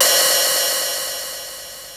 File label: 626 OPHH; Hollywood Edge; The Big Bad Beatmachine; TR-626